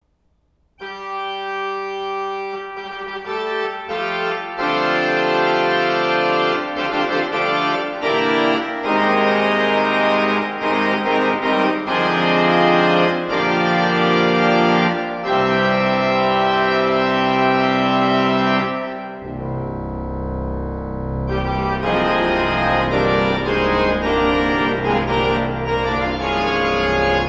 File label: Acclamation; Christopher Tambling